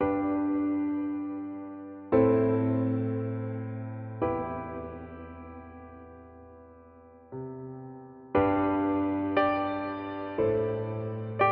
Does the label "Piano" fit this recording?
Yes